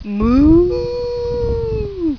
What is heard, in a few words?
moo